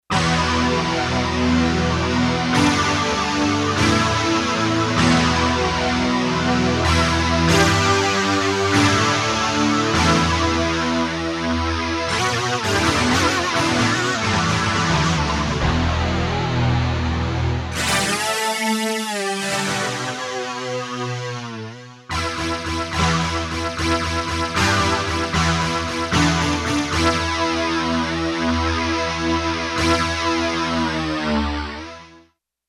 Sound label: Distort Guitr; Digital Synthesizer